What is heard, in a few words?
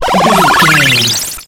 retro magic spell cast